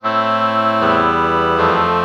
oboe oboe chord morph

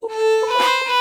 oh violin melody morph

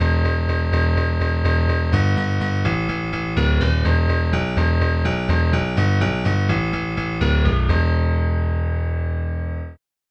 honky tonk bass; SpectMorph Evaluation #4

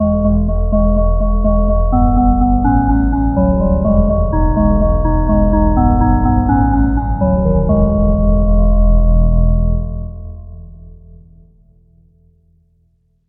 bowed glass bass

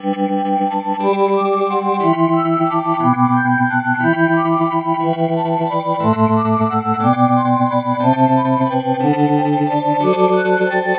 metal pad

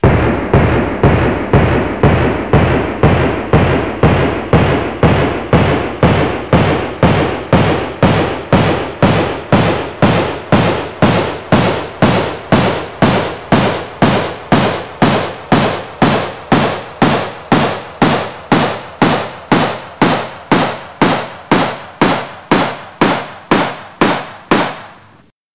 gun shot tonleiter

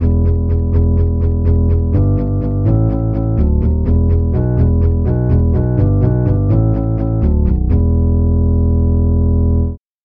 reed organ; bass

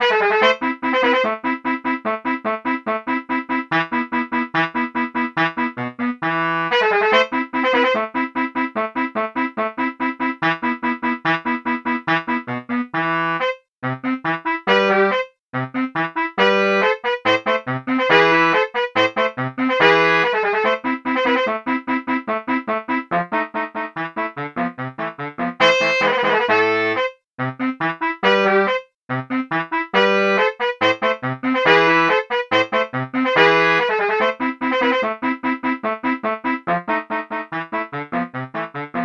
baritone sax

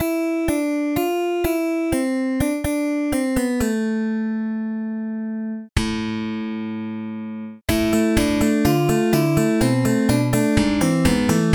slap bass

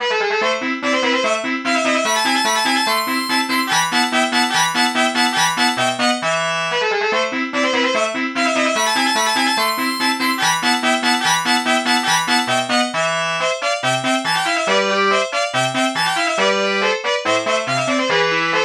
baritone sax

